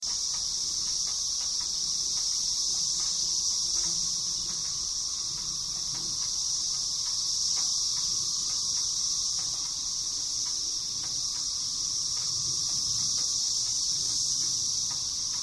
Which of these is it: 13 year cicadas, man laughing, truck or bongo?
13 year cicadas